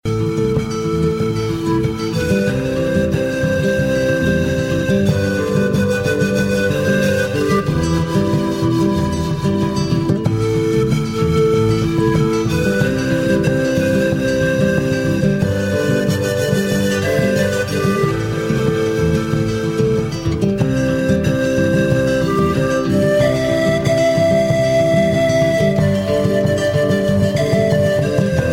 Soft Instrumental Ringtone

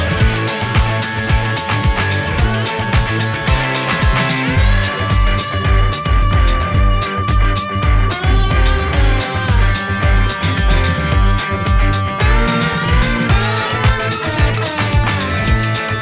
Huawei Mate 10 Lite Ringtone

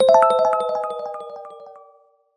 Notification Ringtone; Oppo Reno 7Z 5G

